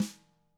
TAMA SNRD, TAM HIT; ILIO; Double Platinum Drums 1

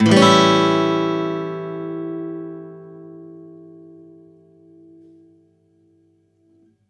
One Shot A 7 downstroke, Acoustic Guitar; Sonic Foundry (Sony Creative Software); Songwriter Acoustic Guitar Companion

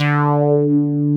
DIGALO D4, DIGALOGBS

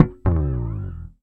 P bass uh oh; Sampleheads; Will Lee Bass Library; PBASS EXTRA

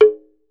TUNG HI, African Perc; E-MU Producer Series Vol. 7 – Old World Instruments

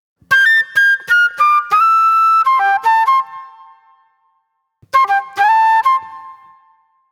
Acid Jazz Flute

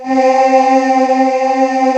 PAD CHOIR C4; USB Soundscan vol.28 - Choir Acoustic & Synth; AKAI

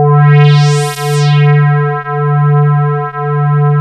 swelled K3, Kawai; Rubber Chicken Software Sample Bank

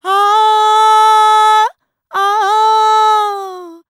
World Voices, INDIA; ILIO; Vocal Planet

